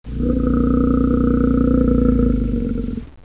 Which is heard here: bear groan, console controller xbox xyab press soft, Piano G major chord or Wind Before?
bear groan